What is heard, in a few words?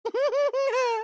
laughing; junkrat